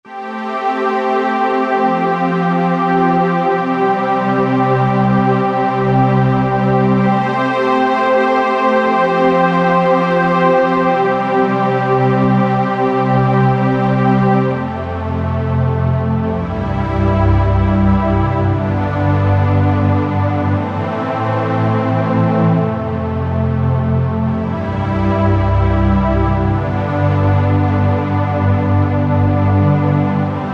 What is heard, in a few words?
Underscore PAD; Worship Flow